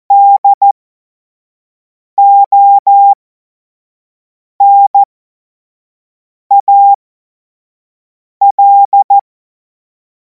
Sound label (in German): Witz, Morsekurs, cw morsen; hamradio; cw witze